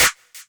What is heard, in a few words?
Snares, Elektron MachineDrum SPS1 MKII